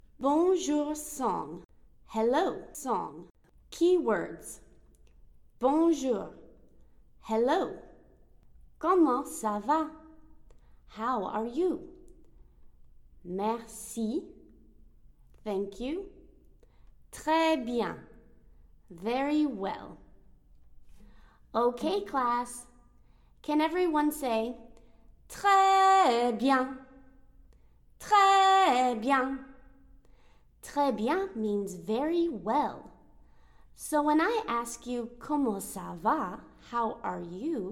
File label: Hello Song French; Rituals